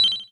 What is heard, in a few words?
collect